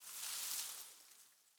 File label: leaf rustle grassy med